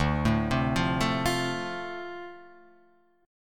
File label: D-Minor 7th D, chord